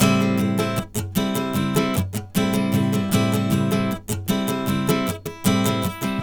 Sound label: Acoustic Guitar, Indie Rock; Sonic Foundry (Sony Creative Software); Indie Rock Anthems; Session 12 - Spaced Out Knoll